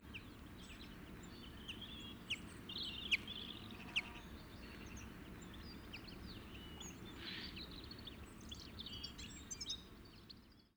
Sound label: Common Chaffinch Flight Calls Of Individual